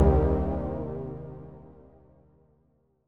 lfo pitch

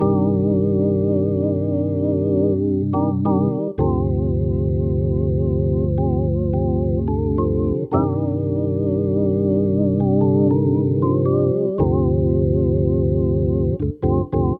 casio xw-g1 perc. organ

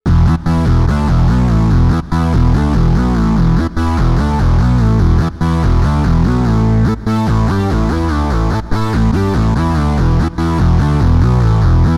Waldorf Quantum test lead

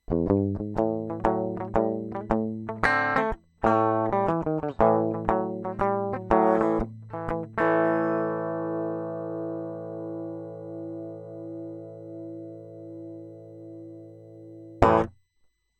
dry, guitar; alesis guitarlink

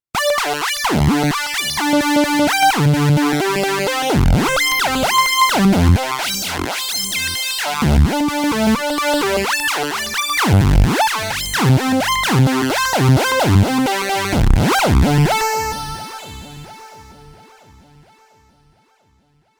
nord lead a1 arp glide

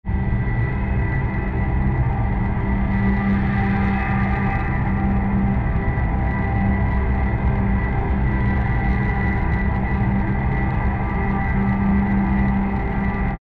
harmonics pad modern more control; Analog Saturation Processor; Softube

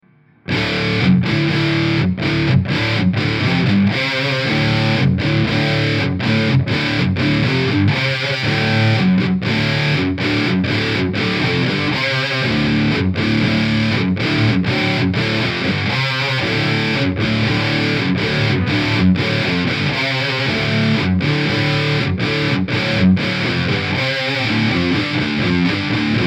3 dubs tight dry wet; tc electronic mimiq doubler

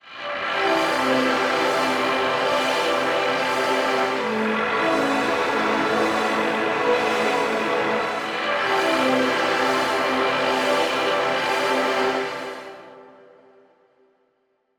pad; heavyocity gravity

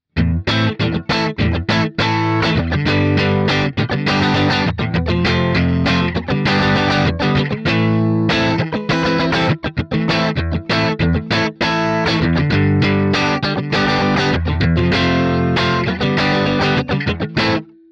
rock dirty; vox mv50 rock test

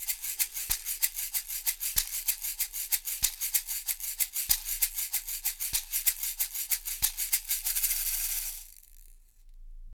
shaker

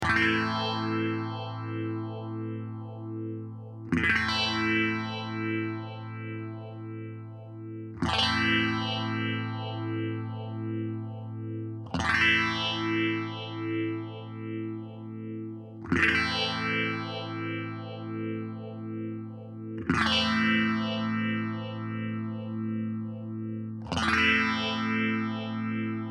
fender vibro king; ik multimedia amplitube 4

